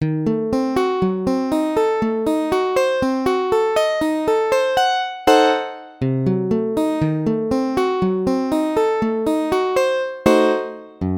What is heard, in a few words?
A dim arpeggio